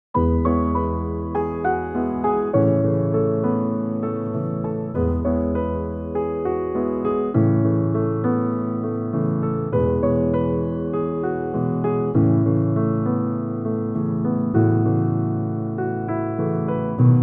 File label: Classic Piano Classical Piano Music Peaceful Piano Chillout